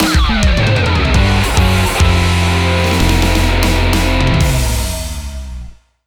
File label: Mission Fail; Naruto: Clash of Ninja Revolution; Wii